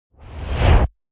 Rush, Rushing sound; Rushes; Sound Design

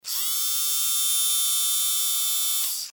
Servo Mechanical SciFi Robot Toy; Scifi Servo H Mechanical Robot Small Motor Toy; BBFX

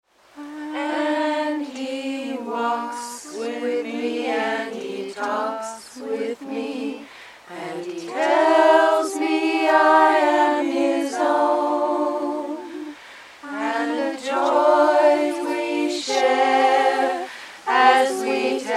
Prayer Group Women Singing, Religious group; Deep South American voices; Singing Sound Effects

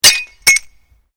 GLASS SHARD SMASH

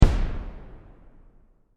Explosion Firework Boom Single; Firework Sound Effects; Fireworks (Sound Effects:Backgrounds); SFX Bible 6

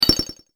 Robotic Menu Click; Robot Sound Effects

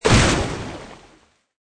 Magical Water Elemental Attack; Magic sound of casting spell, conjuring or wizardry. Witchcraft ritual summoning elemental; Magic Sound Effects

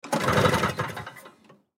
Snow Blower Stall, Snow Blower Pull Start Stall; Blastwave

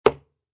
Sports Darts Impact Board; Thud Sound Effects; Objects; Lynne Music

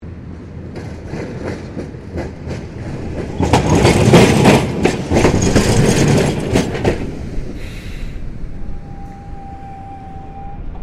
Tram Melbourne W Class Crossing Points Stopping; Various Melbourne trams; Streetcars; Vehicles

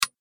Impact Hit Clink; Miscellaneous Impact Sound Effects

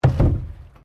PLASTIC BARRICADE IMPACT; Material components for constructing more complex sounds

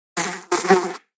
Fly Buzzing Insect; Insect Sound Effects; Nature / Insect

